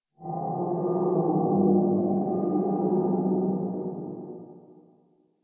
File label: Exterior Distant Calls Angry, Ambience; Warframe; Duviri